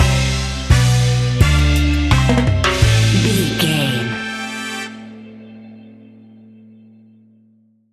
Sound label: reggae music stinger, Classic reggae music with that skank bounce reggae feeling.; Kingston Reggae Music